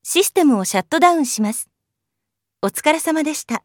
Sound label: Shutdown; Mayuri System Set